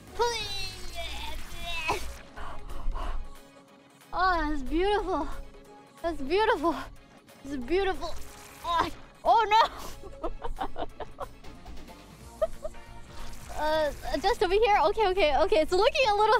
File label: noises